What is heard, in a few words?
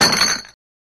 Bottles Clanking